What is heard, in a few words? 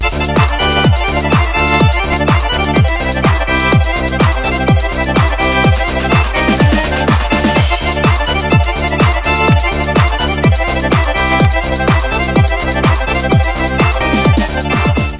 lezginka